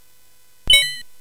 Dark Castle, CSG8580R5

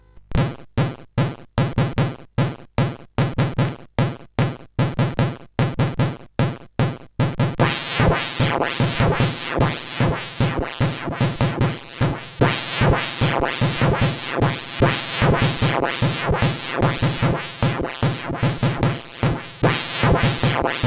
sid, CSG8580R5

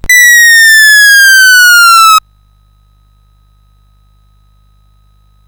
Chopper, CSG8580R5

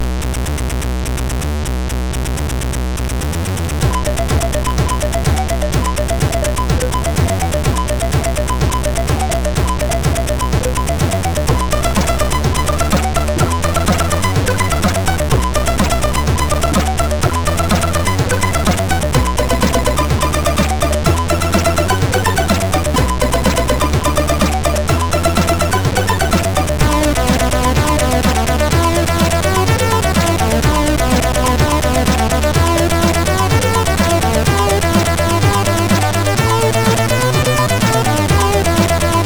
Nice Introtune, MOS6581R3

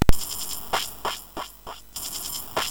FX, MOS6581R3; HVSC; Software House

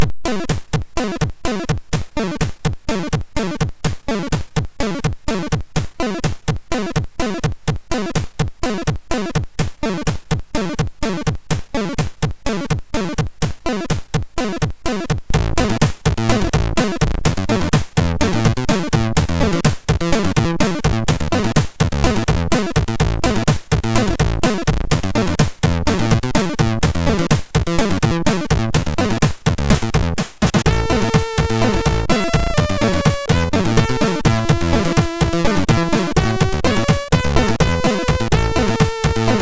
Dance Tune sid MOS6581R2

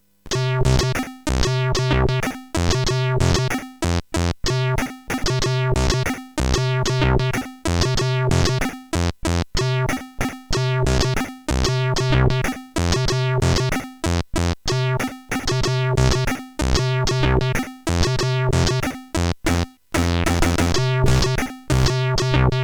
Just a song, sid CSG8580R5

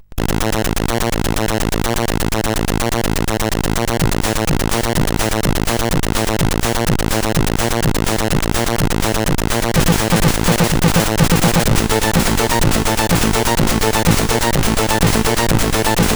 Techno, CSG8580R5

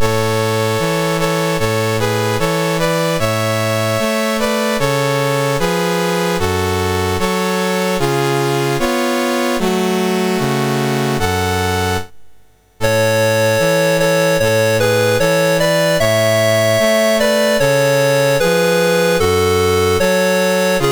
Ukraine, National Anthems; MOS6581R3; Dave Marquis

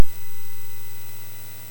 MOS6581R3, GAMES; Spikes Peak

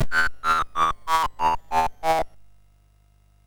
Final Synth Sample, MOS6581R3

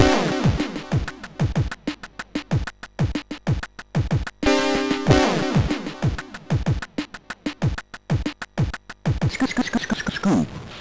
intro, MOS6581R4; Eye to Eye

